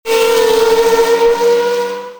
Formule 1

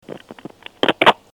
Raccrochage

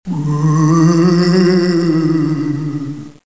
Fantôme, Halloween; bruitage

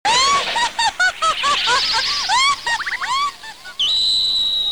Ah ah ah femme, Rires

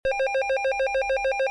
Téléphone

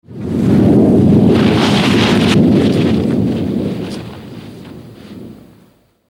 Avalanche court, bruitage, Ski

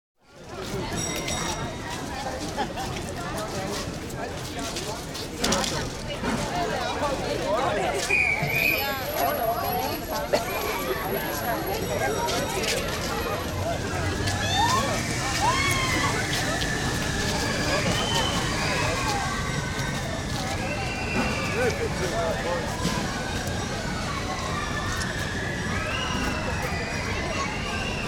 Parc d'attraction, Ambiances; bruitage

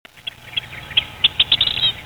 Ambiance exotique; bruitage

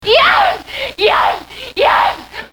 Orgasme

